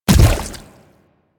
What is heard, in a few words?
Splitter Step Water, Footsteps; Warframe; Infested; Grey Strain